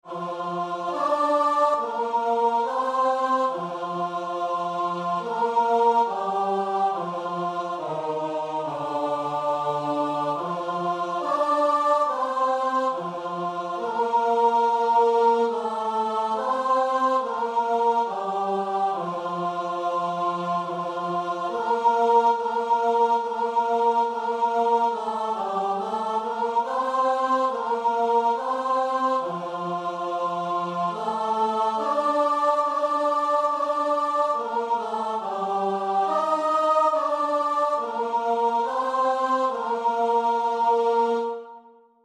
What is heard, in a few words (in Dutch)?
GKb 70 (koor); Gereformeerd Kerkboek; Gezang 70 (met bovenstem); Oefenbestanden